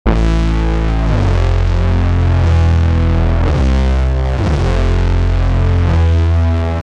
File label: 80s bass synth sound